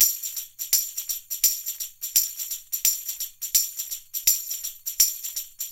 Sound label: Percussionworks